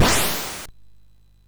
sid MOS 6581R2

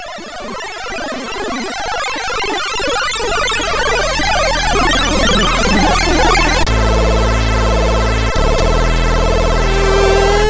sid MOS6581R2

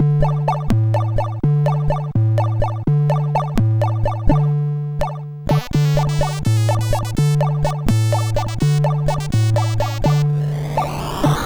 sid CSG8580R5; Search for the Vanished Princess

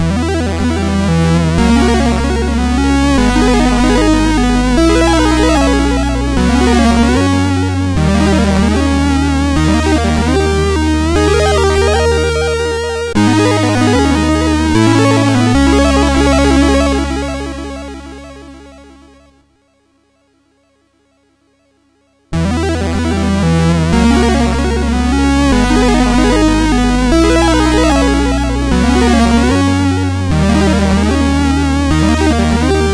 Game Over, MOS6581; sid

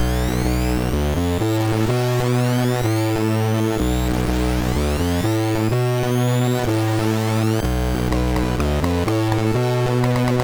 Jack o Lantern, MOS6581R3; sid

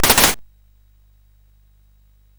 sid CSG8580R5; Gremlins 2-The New Batch

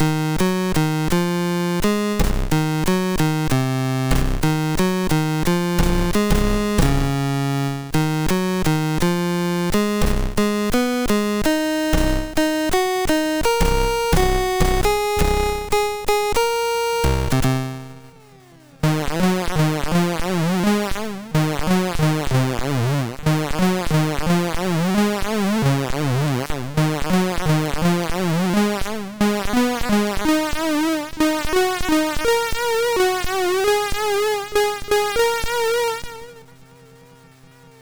MOS6581R2; sid

